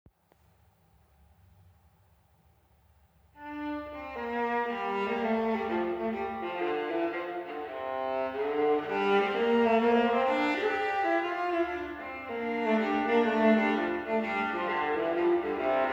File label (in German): Viola